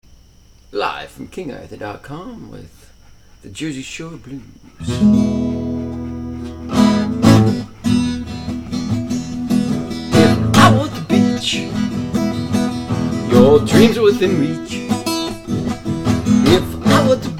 Blues Soundcheck

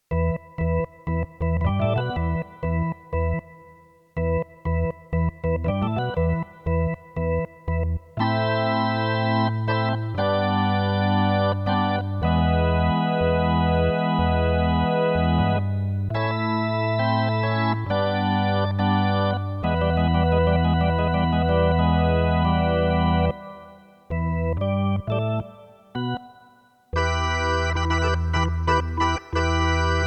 Old School organ prelude

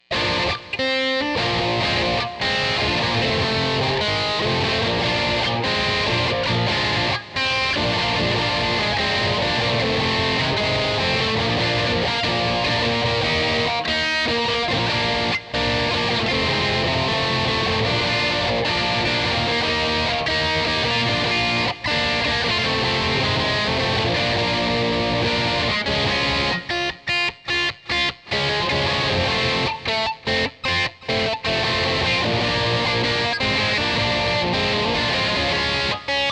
electric

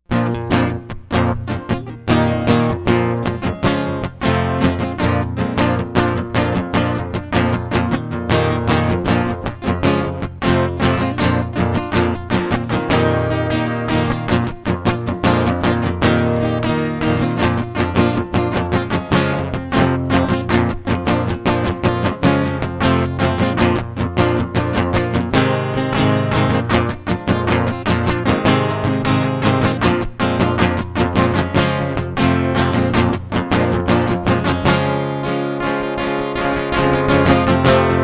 Disturbances acoustic

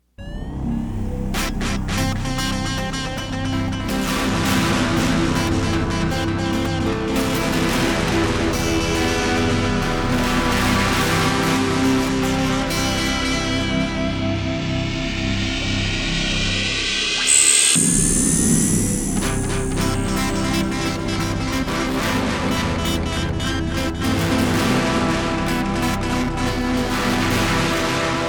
electric

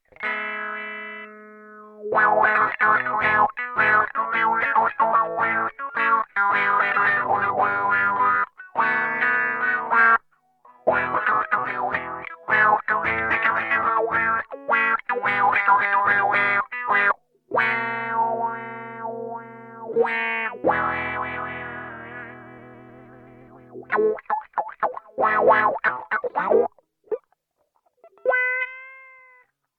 electric riff

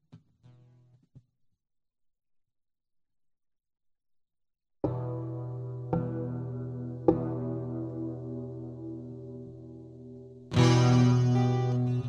Soundcheck

